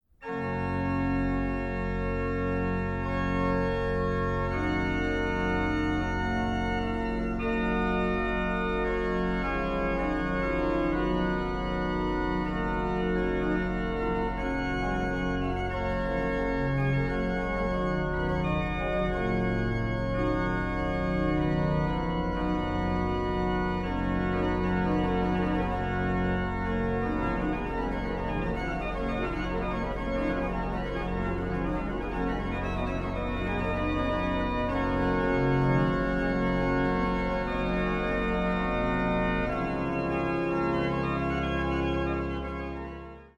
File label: Toccata Sesta, organ; Girolamo Frescobaldi; Works for organ